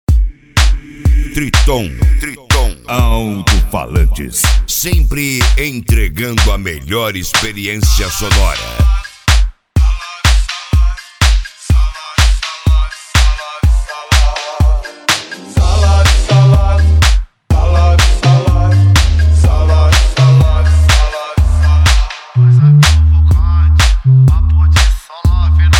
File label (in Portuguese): alto falantes especial funk mix; Triton alto Falantes